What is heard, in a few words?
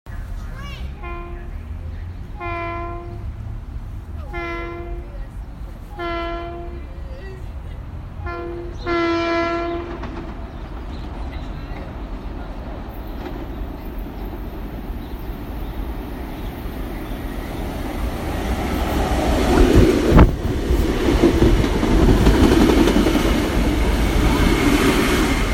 Arrival of Train